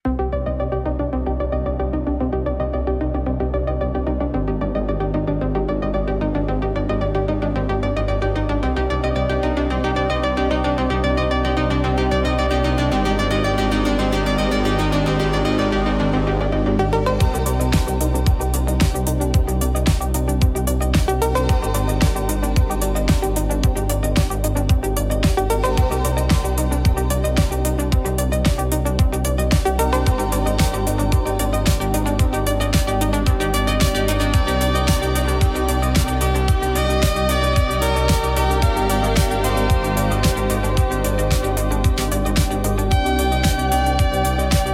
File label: Electronic Impulse